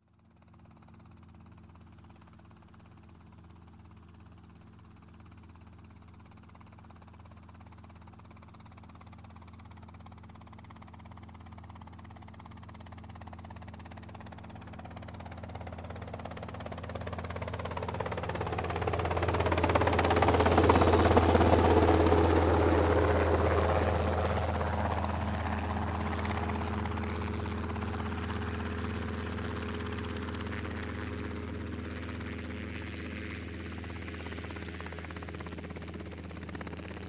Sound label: Helicopter slow fly over